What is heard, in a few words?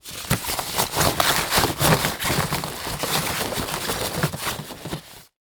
Sports Bag Golf Bag Rummaging Through Pocket